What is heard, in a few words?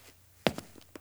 zombie footstep; Project Zomboid v41.73